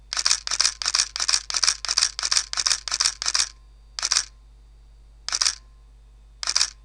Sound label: D70s continous, Nikon